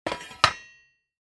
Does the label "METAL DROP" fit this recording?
Yes